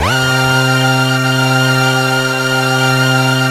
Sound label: JV-1080